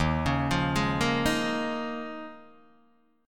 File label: D sharp 7th Suspended 2nd Suspended 4th, Guitar, DADGAD